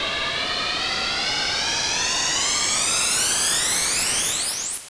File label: vibrato